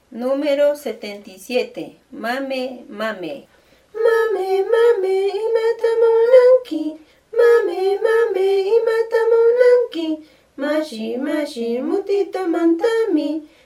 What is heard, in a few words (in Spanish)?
cancion